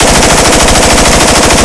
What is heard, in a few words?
mp5 shoot loop, weapons